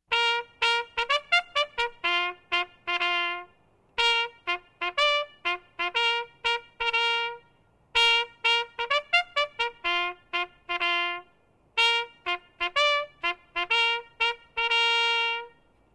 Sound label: Trumpet First Call